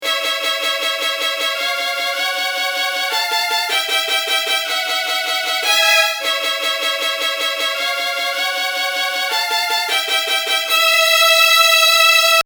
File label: Backing